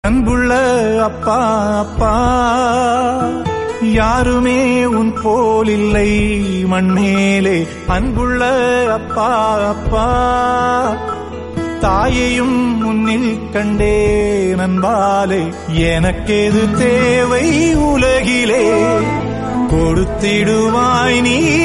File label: tamil song; sigaram thodu